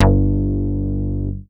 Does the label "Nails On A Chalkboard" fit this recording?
No